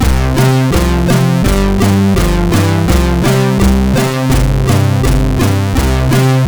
Old Music, MOS6581R2

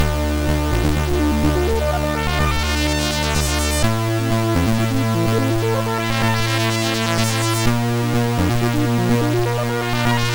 Flute, MOS6581R4

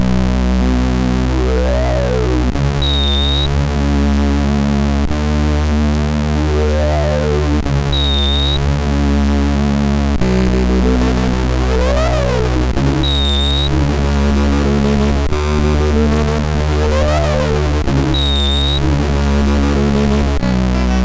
Fright, MOS6581R2

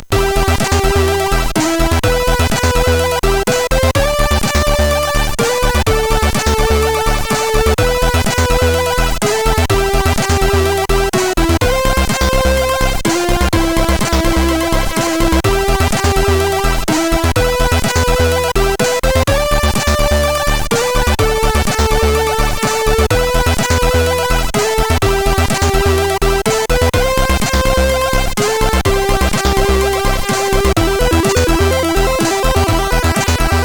C64 DTV menu, CSG8580R5; hvsc

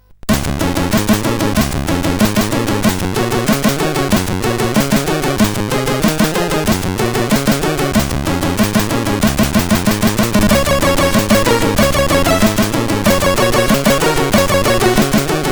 Rock n Roll, CSG8580R5; Lets Rock n Roll

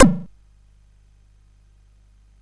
Tecnetium, CSG8580R5

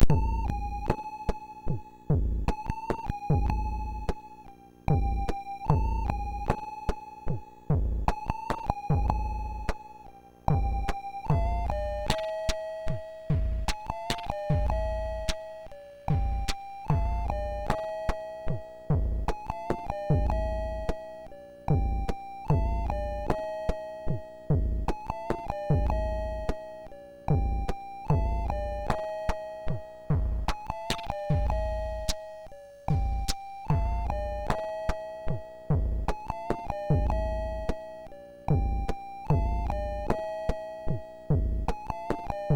Ambient, MOS6581R3; sid; hvsc

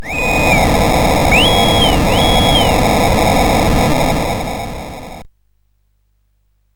sid CSG8580R5, GAMES; Card Sharks

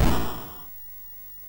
sid MOS6581R3; Storm Chase

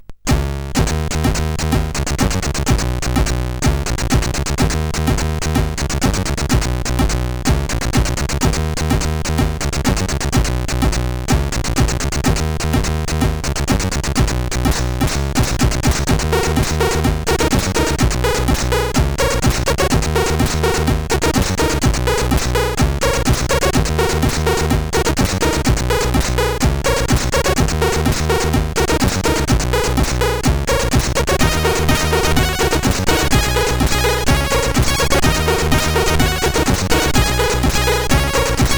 sid, CSG8580R5; hvsc

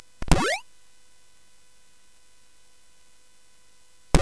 Sound Fx, CSG8580; hvsc

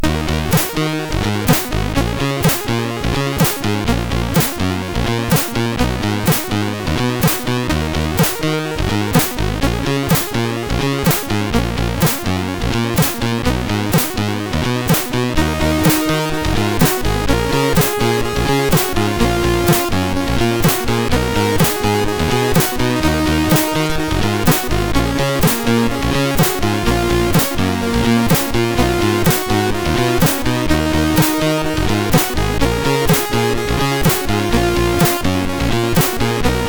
alt bass, sid MOS6581R3; hvsc; Moon

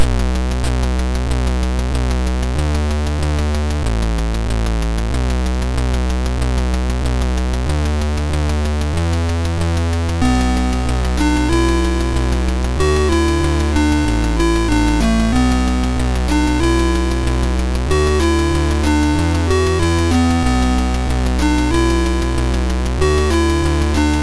tune, MOS6581R3

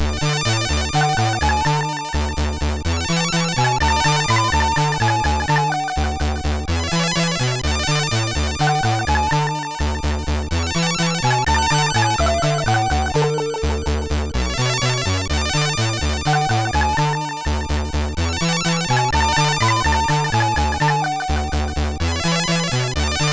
Drizzle sid MOS6581R3

